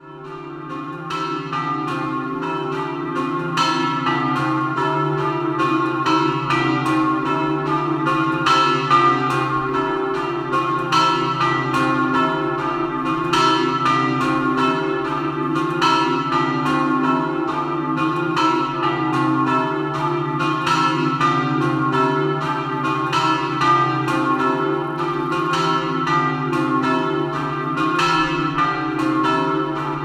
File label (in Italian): San Giovanni di Casarsa, campane